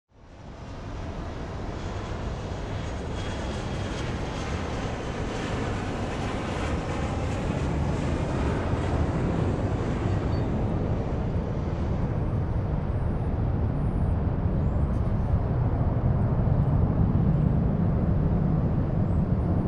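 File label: exterior planes taking off and landing; Звуки аэропорта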